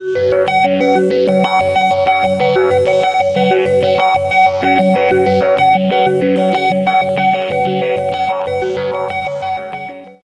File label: samsung mobile